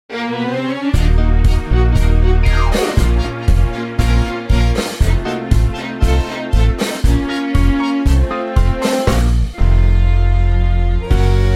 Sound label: tango Bbm